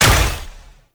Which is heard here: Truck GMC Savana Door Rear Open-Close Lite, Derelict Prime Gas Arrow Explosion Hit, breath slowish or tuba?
Derelict Prime Gas Arrow Explosion Hit